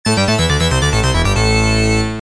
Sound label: arcade player out; tiger road